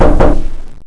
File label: door iron knock; easyrpg; WitchsHeart